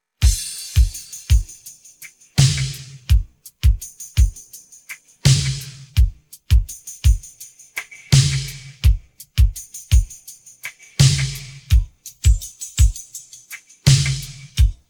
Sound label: pattern, drums